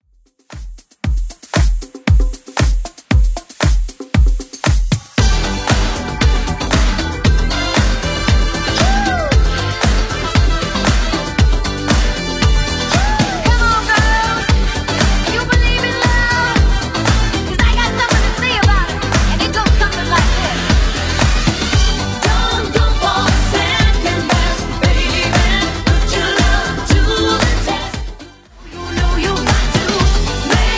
80s Club Edit 122bpm

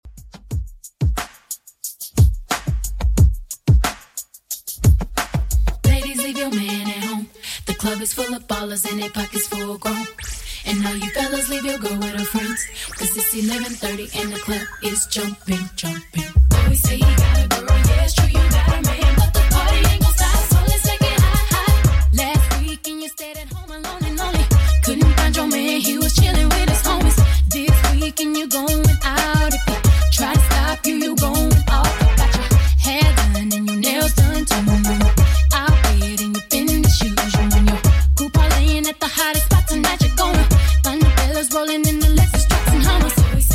Throwback Rnb 90bpm

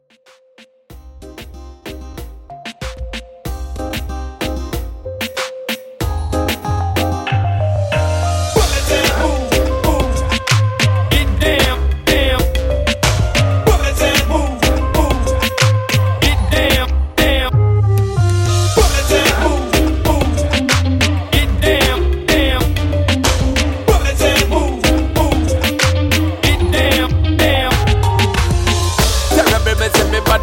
Afrobeat Dancehall 94 BPM

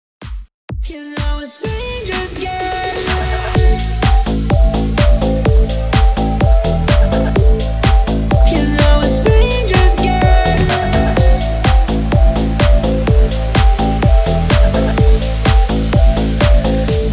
MASHUP 126 BPM

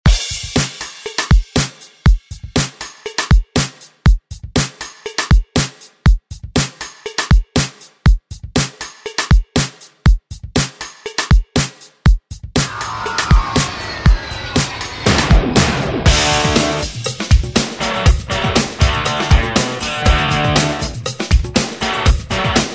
90s; ReDrum

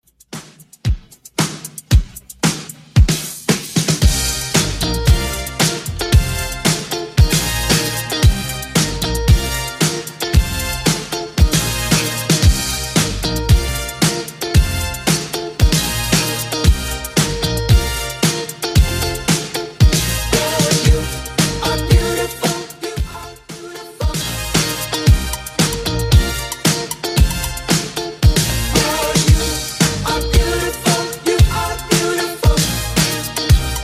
70s Redrum 114bpm

